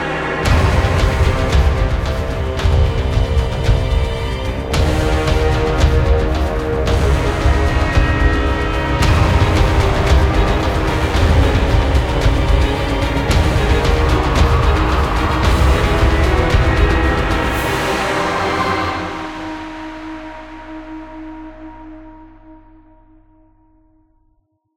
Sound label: Short Music; Ridge